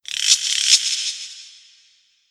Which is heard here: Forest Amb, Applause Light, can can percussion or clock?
Forest Amb